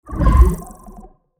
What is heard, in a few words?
Simulation building game menu ui clear